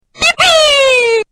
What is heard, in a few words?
jump; freak fortress 2; mario